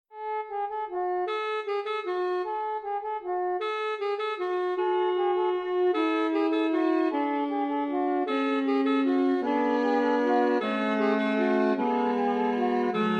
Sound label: Sax